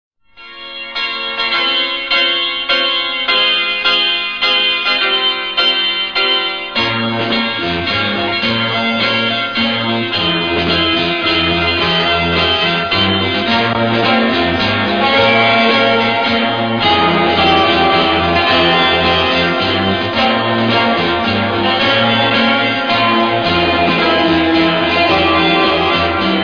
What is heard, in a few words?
ringtone